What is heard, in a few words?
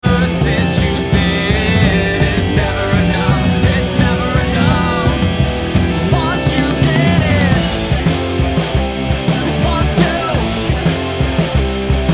POP LIVE